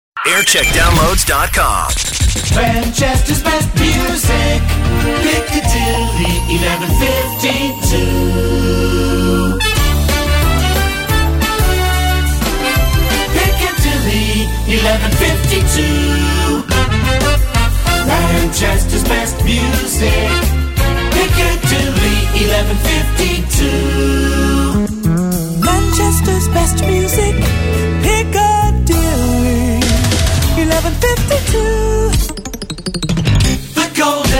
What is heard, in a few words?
Jingle Montage; Piccadilly 1152; JAM